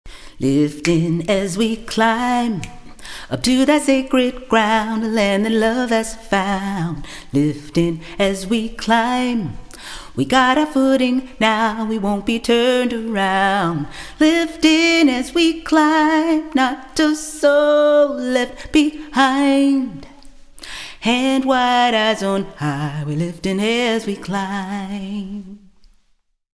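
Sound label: TENOR; choir; The Freedom Choir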